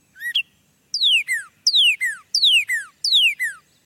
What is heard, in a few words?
birdred; Indev+ 0.3 Portable